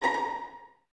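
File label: violins A#5 pizzicato, String Ensembles; Miroslav Vitous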